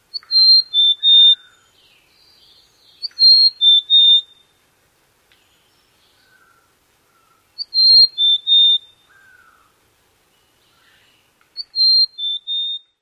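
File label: songs, mountain chickadee; CA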